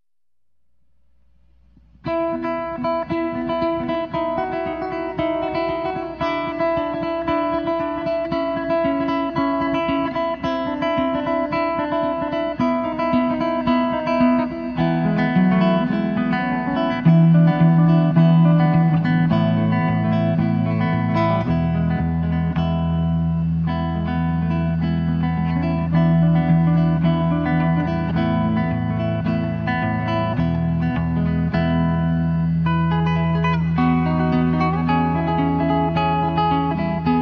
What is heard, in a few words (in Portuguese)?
instrumental